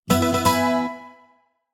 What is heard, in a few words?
Jingle Levelup; Pokemon Masters EX